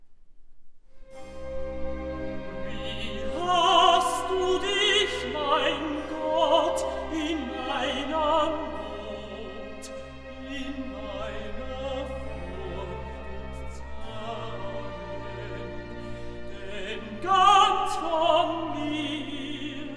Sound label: Recitativo (Tenor)